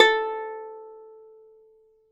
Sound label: plucked Lyre A4; Polyend; Simulacrum Sounds; 1 Shots